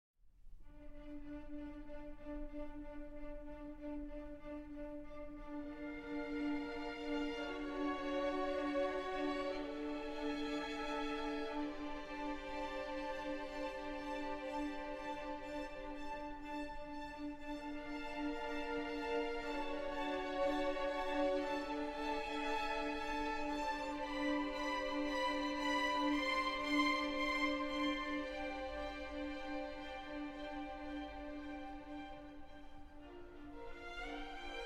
two cellos and string orchestra; Violoncelles, Vibrez!

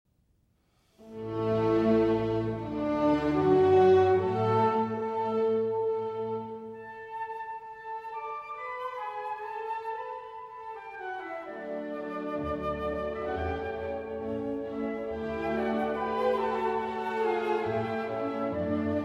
Flute Concerto; Claudio Abbado; Mozart; No. 1 in G Major

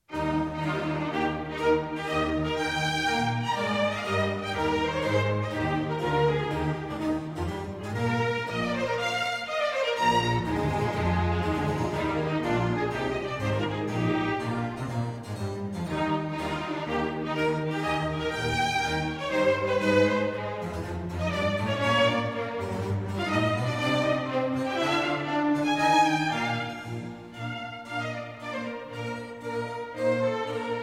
Trumpet Concerto in E-Flat Major, Allegro; Hertel Trumpet Concerto No. 1

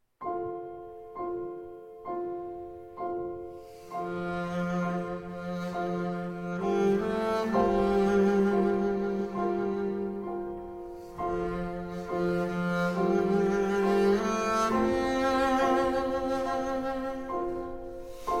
Double Bass & Piano; Cantique

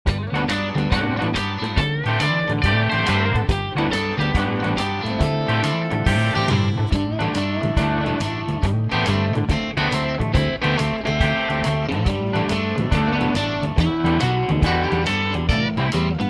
Instrumental Blues

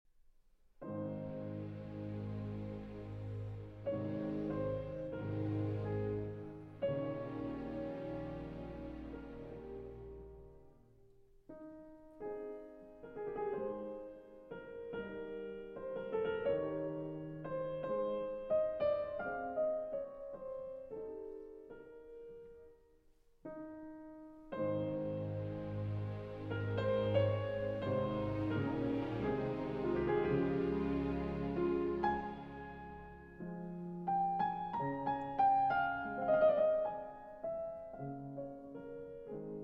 Piano Concerto No. 1 in C Major

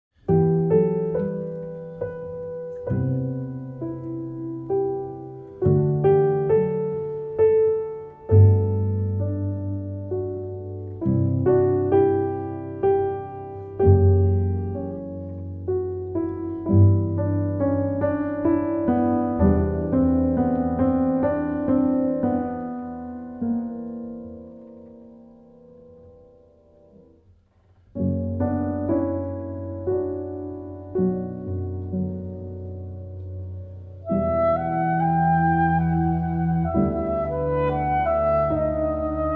Saxophone & Ense; Silvestrov; 2 Christmas Lullabies; Arr. Tsupryk; Saxophone & Ensemble

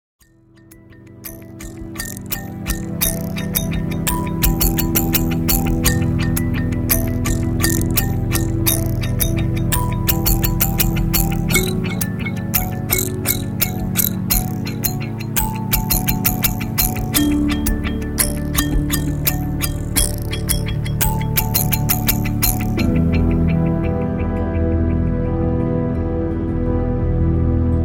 Instrumental Oriental Music

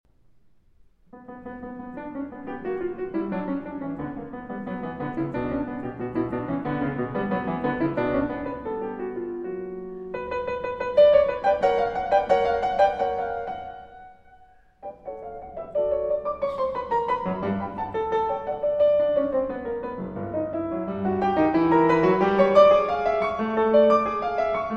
Keyboard Sonata in B Minor

